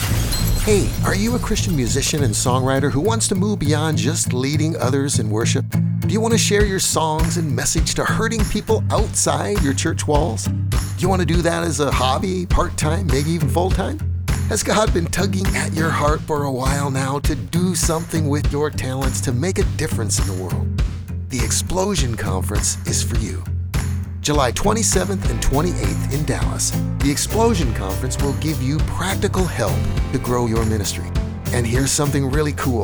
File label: Explosion; 60 seconds